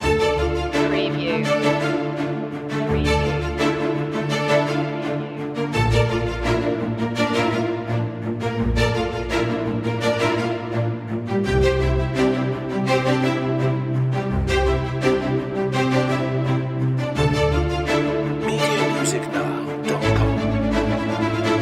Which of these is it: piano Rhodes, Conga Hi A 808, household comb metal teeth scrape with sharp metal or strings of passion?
strings of passion